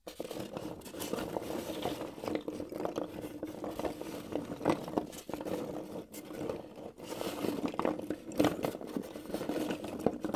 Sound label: Rumble Earth Quake